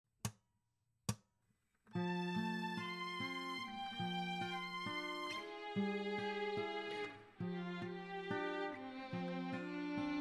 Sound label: violin